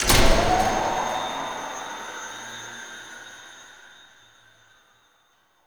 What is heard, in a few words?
Mechanical Start; Warframe; Nokko Colony; Visions